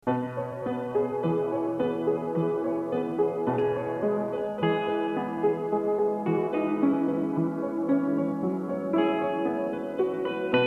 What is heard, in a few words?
experiment piano guitar